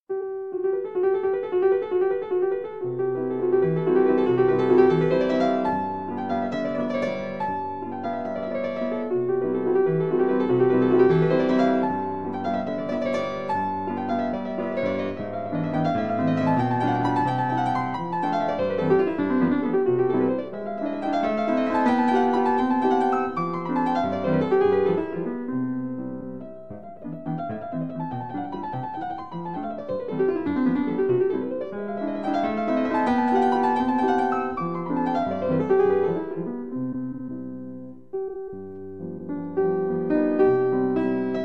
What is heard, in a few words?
Classic Chopin Minute Waltz